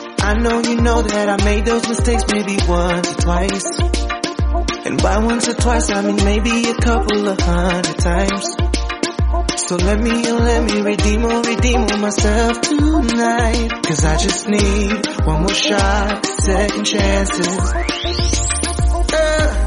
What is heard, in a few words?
reggae